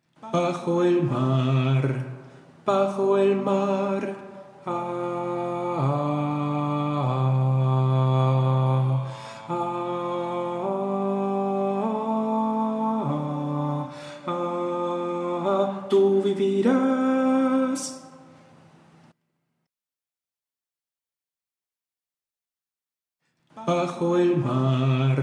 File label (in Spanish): bajo; LA SIRENITA